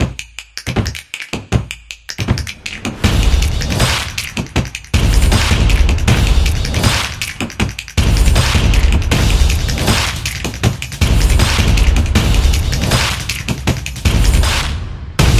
Dynamic Typography, soccer drums

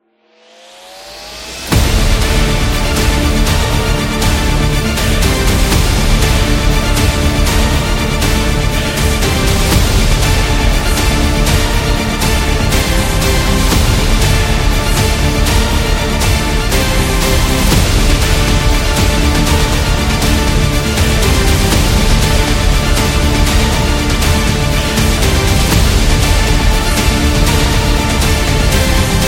Epic Action Scene

